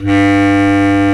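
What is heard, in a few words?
Bass Clarinet; Roland L-CDX-03 Disk 1; Lo Clarinets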